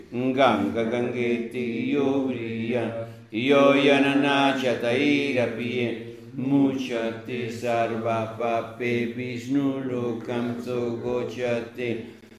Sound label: Gangangajeti Mantra; mantren